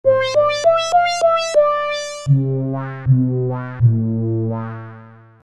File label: rev lpf